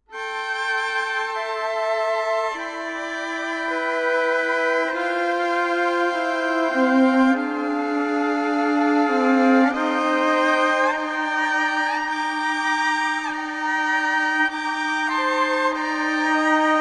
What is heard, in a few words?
Strings